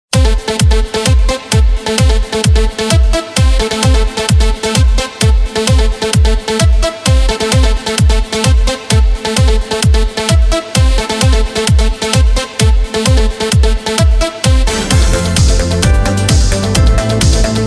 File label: disco, Korg Pa3X